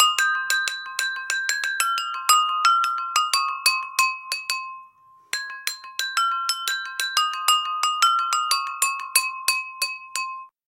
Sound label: Knock; ZTE; Blade-A5-2020